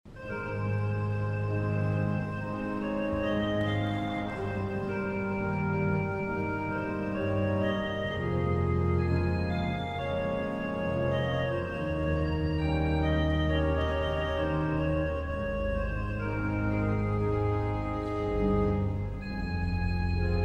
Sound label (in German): 2. Satz aus Konzert Nr. 5 f-Moll, BWV 1056